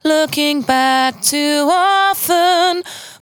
92bpm Bb, Velvet Vocal; Zero-G

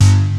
P5 BASS G1, Prophet Five; E-MU Formula 4000 Series Vol. 3; Analog Odyssey

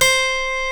D, funk guitars

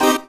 HOUSE, House Dance

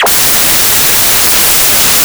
WHITE NOISE; Big Beat Samples & More; AKAI

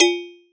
FX JUG BND; ModelCycles

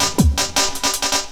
LOOP SD; Ueberschall; Drum & Bass Resonance

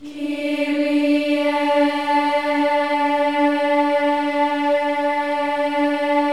KYRIE, Symphony of Voices

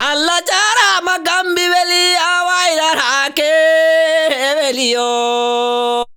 VOCAL LINES; Best Service; World Colours

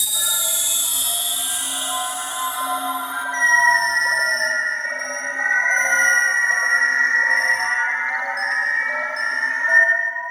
Ice Crystalz; Sonic Foundry (Sony Creative Software); Synchro Funk

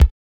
mod kick, Mod Drums; neuro; Sinistarr

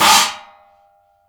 CHOKE, cymbals; Sampleheads - New York City Drumworks VOL-1; SW CYMBALS

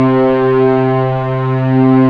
STR, Orchestral Strings

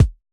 kick, Roland MC-909

